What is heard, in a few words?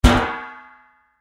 Impact Trash Can Lid Metal Bang; Bangs